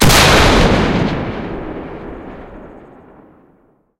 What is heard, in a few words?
cannon 106mm m40a1c shot